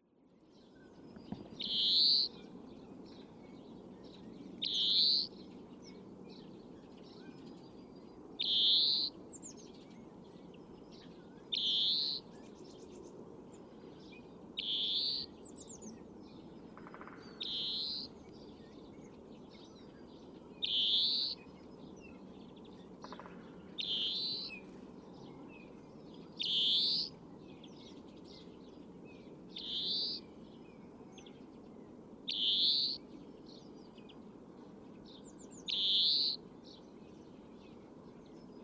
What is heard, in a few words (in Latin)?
Chloris chloris; SETE